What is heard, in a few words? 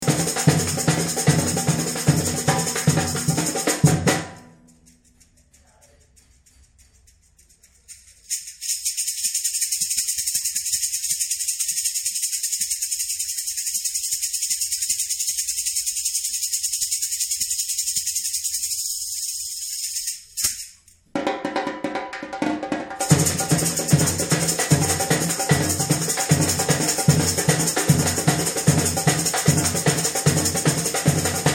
chocalho